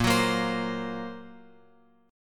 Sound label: A sharp Suspended 2nd Sharp 5th A sharp; A#sus2#5 Chord {6 9 8 X X 8} strummed